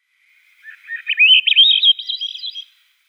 Zwergdrossel Catharus ustulatus, Vogelstimmen

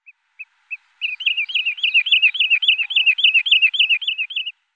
Bruchwasserlaeufer Tringa glareola; Vogelstimmen

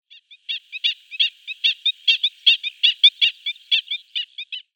Stelzenlaeufer Himantopus himantopus, Vogelstimmen

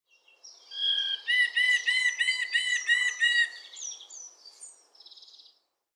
China-Graubauchhaeherling Dryonastes berthemyi; Vogelstimmen

